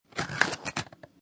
Paper Box Or Cardboard Box Close; Sound Effects:Materials

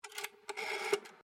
TELEPHONE OLD ROTARY BAKELITE DIAL; Bakelight phone; Telephones; Electronics

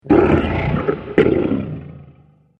Massive Dragon, monster; Monster Sound Effects; horror sound effects

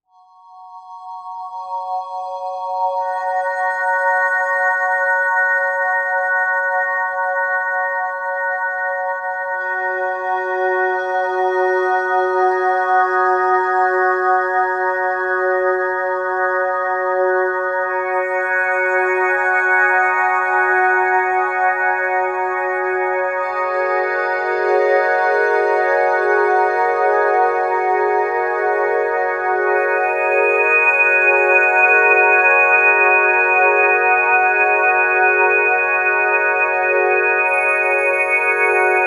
Bed Bell Ring Pad; Bed Sound Effects; Blastwave; Production Element Imaging Element Accent Transition

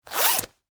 Leather Jacket, Zipper, Up; Foley, Clothes, Leather, Jacket, Zipper, Down; Dressing Sound Effects

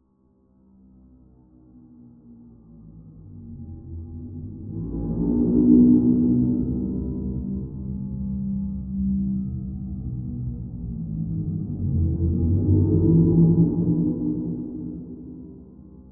Horror Chime Reverb; Musical Sound Design; BlastWave

